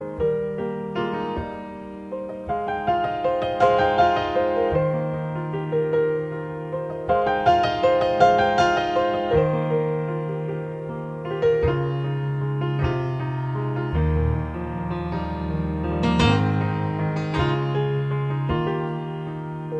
Celtic Cradle Song

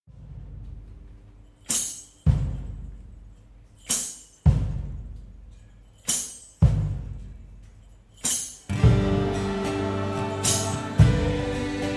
acoustic version

